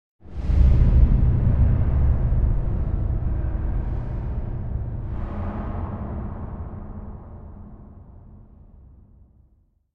Impact Metallic Distant - Distant Hits And Voices; Hollywood Edge; sound designer's toolkit v2

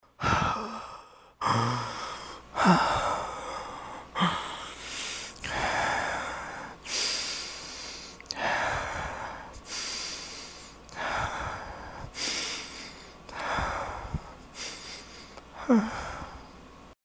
breathing; blindside

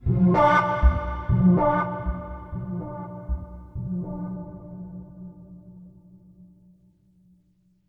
String Mystery; Inquisitor 3

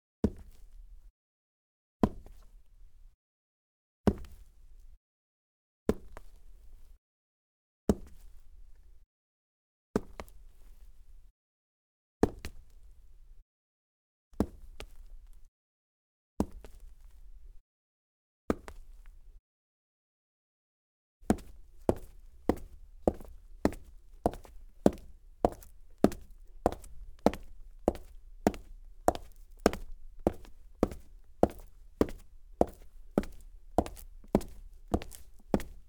Cement Clean Dress Boots, Foley Footsteps; Sound Ideas

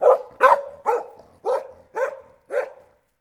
dog distant; DeadFright

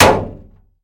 hood impact; Blind Drive

